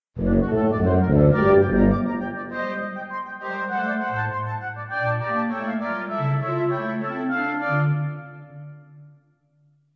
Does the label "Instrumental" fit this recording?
Yes